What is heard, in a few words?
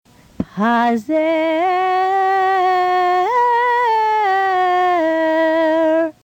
Haftarah Pazer; bnaimitzvahprep